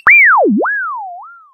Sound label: point up